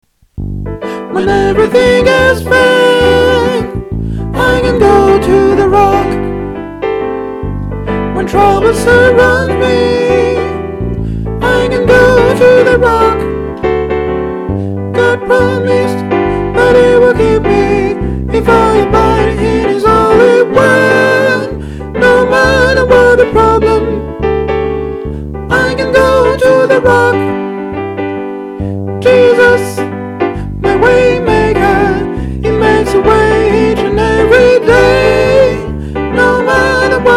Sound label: soprano